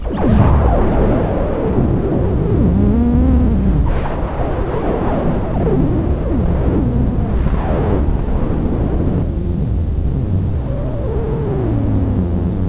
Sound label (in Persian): نمونه ی صدای برداشت شده توسط میکروفن آبی ما